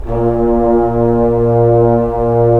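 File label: brass trombones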